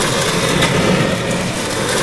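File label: metal scrape heavy loop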